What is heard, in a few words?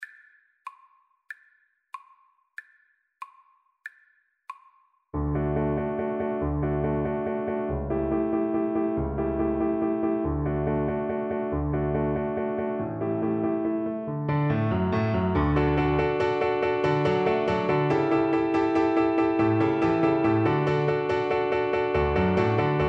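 viola karaoke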